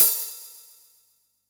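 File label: hi-hat open, Yamaha DD-65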